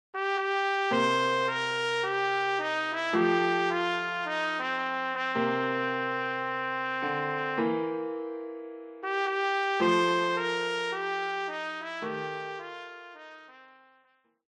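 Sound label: trumpet; Klavierbegleitung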